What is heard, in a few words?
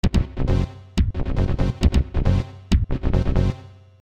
Gyrocopter - Crash